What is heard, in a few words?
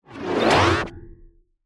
energy charge; soundscrate